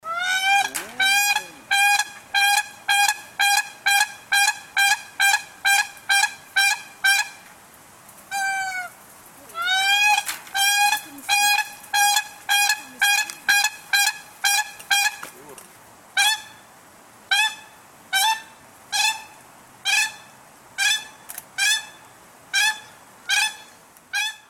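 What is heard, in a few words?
crane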